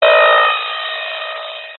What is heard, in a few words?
cb radio static interference burst; technology